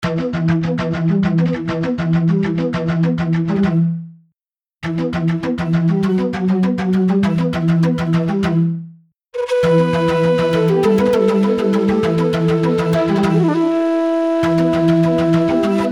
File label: Flute; Librewave; Emerald Flute Library